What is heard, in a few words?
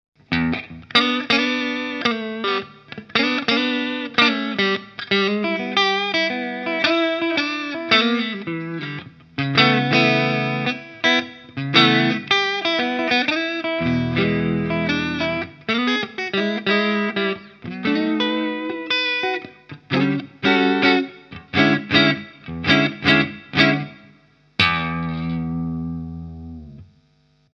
Walrus Audio Julia vibrato bluesy sawwave